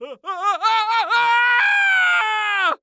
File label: Horror Scary Human Male Scream Stutter Scream